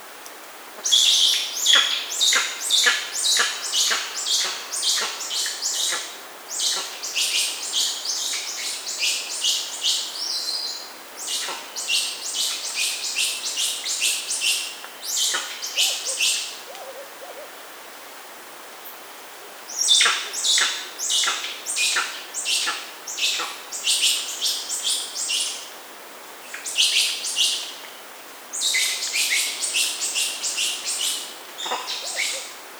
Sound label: Tree Hyrax Song